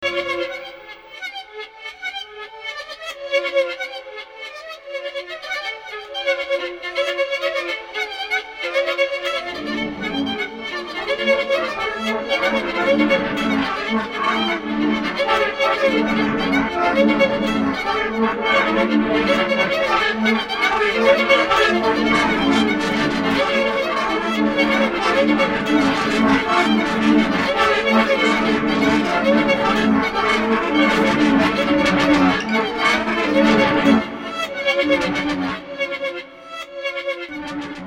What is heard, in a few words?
Quintet For Strings